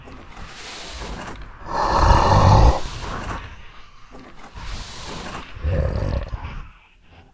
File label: phase three attack, Necromancy